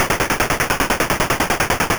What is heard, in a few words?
gun